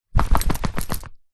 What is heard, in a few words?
animals dog shake water off body collar rattle; zapsplat